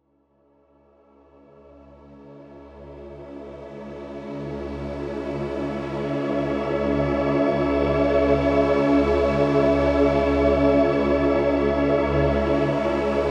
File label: Peaceful Flow Meditation